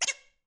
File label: quack